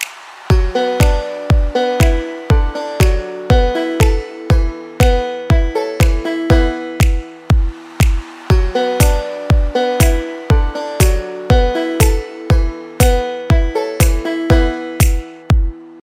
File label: Ukulele Remix